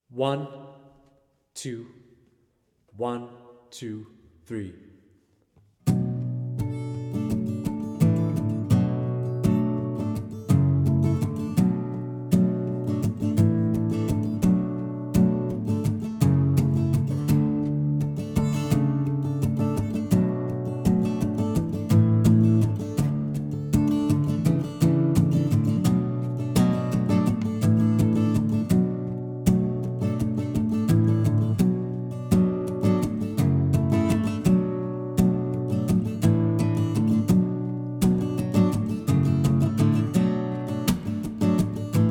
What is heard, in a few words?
Guitar Steady; The Musical Priest